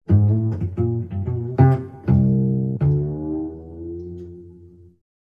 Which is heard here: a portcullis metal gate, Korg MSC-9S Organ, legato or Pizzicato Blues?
Pizzicato Blues